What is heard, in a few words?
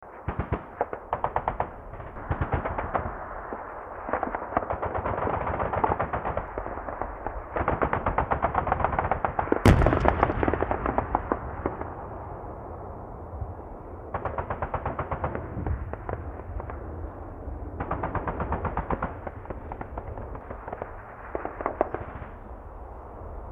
Battle Background; 1944 Battle Of The Bulge